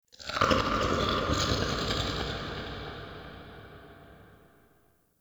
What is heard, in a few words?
Creature Distant Low; Warframe; Infested Eris; Ambience; Outdoor